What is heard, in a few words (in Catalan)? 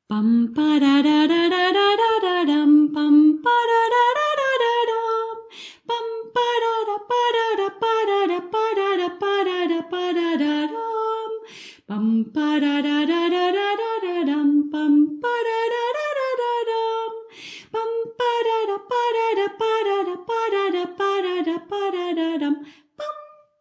Vent de Tardor